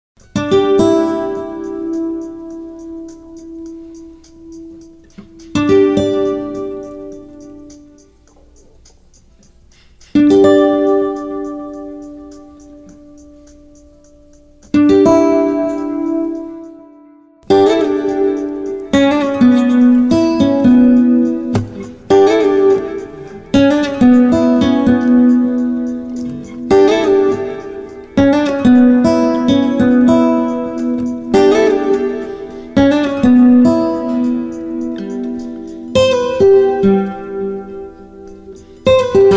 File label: melody mic and DI